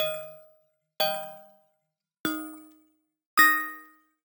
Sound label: Arcade Click Neutral; boom library; The Interface